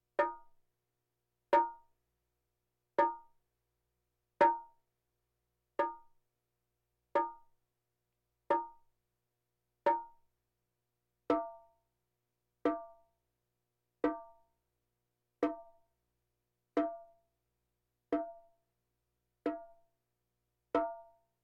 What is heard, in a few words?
Percussion Bongos Tympani rolls Gongs Drums